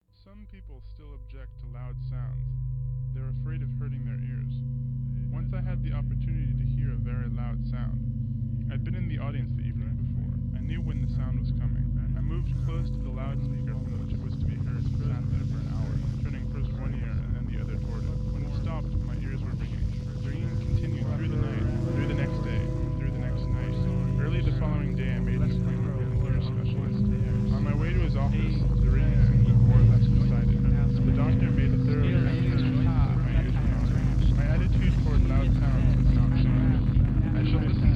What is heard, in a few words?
loud sound